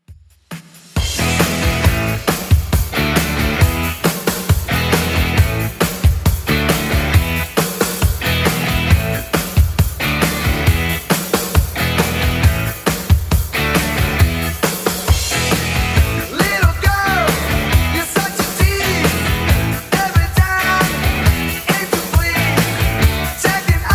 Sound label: 80s Club Edit 136bpm